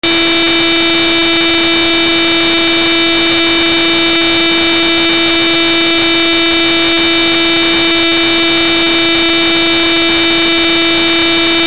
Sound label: 1kHz 0dBFS, tuning; LG; Viper LS840